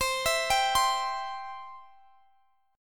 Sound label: C Major C down Guitar Drop D